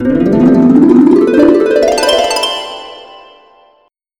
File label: fx harp